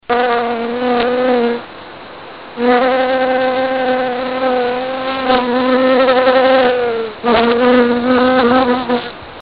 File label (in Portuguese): abelha